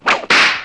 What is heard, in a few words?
CARD UP